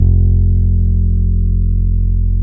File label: DUB BASS, Electric Basses; PlatinumPhattCD2; E-MU Producer Series Vol. 8 – Platinum Phatt